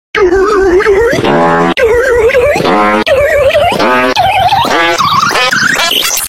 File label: brain fart